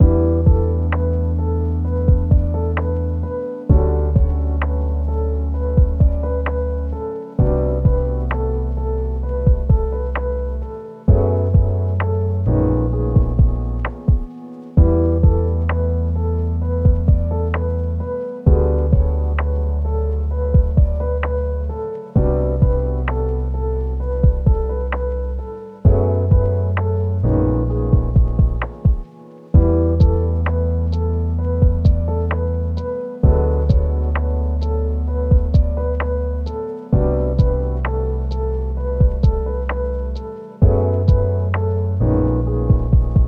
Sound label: Groove